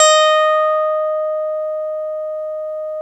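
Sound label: OB8 piano EB4, OBERHEIM OB8; Big Fish Audio - First Call Vintage Keyboards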